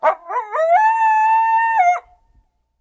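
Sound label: loud barking intimidating howl of coyote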